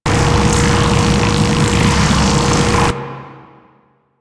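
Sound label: Settlement Power machine Loop; Ambience; Warframe; Grineer Settlement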